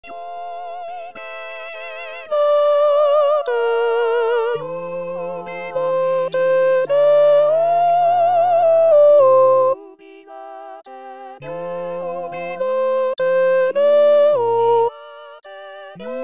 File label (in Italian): Jubilate Deo tenori; voci guida; Bartolucci; corali in vaticano 2024